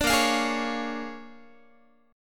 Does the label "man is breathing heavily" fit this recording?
No